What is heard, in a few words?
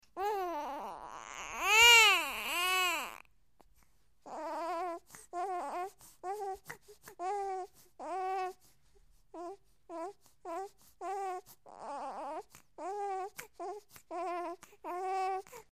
Baby Sniffling Whine